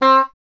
E. Horn

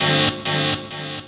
Candy Chord; voxandchords

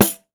10 PANDE, PANDEIRO

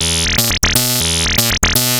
Analoop, Industrial Analog

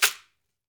AMAZON SHAKER, Latin Shakers

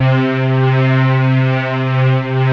PAD, Vintage Voltage

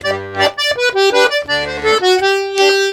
Accordions; USB Soundscan vol.40; AKAI

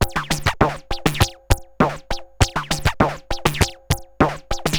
Moog Freqbox RX17 (100BPM), cyborg beats; musicradar

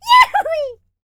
shouts; ILIO; Vocal Planet

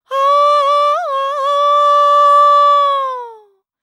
World Voices, INDIA; ILIO; Vocal Planet

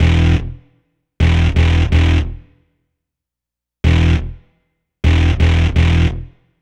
Roller Bass; Dr. Fink's Funk Factory

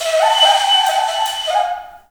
AF FLUTES, Heart of Africa

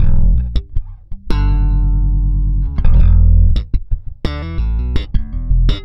Slap, Bass; Bass X

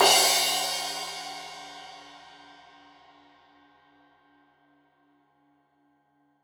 Crash 15in L edge, Cymbals Stereo

